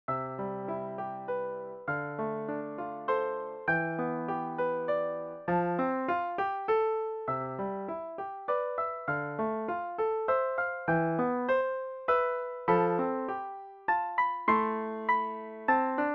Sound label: Willow music